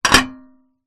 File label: household saucepan put down on portable camping stove